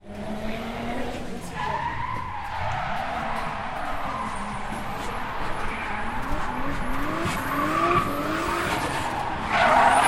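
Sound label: car drift sounds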